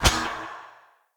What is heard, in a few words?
Mockery Weapon Common Throw Catch, Swords; Weapons; Player